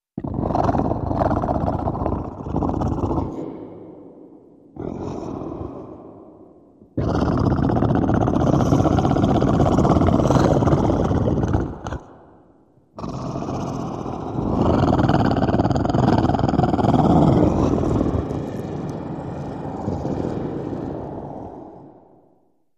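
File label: Deep Wet Growl Animal, monster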